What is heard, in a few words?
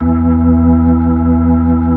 B-3; Keyboards of The 60's and 70's; Full Xfade